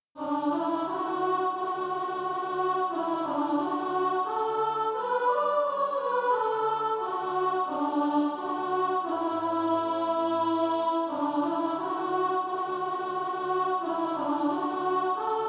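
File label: soprano, Practise Music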